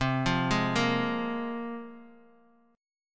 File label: C 7th Suspended 4th Sharp 5th, Bass Standard; C7sus4#5 arpeggiated